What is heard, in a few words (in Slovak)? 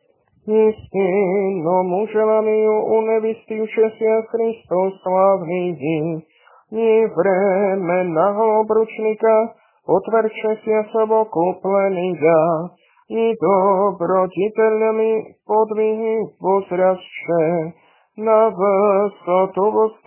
Tropár prepodobným ženám, hlas 2; nedelna-skola